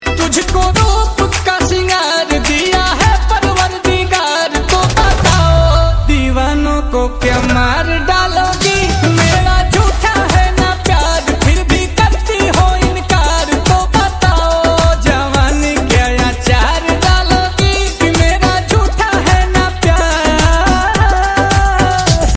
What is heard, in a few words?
DJ Remix Song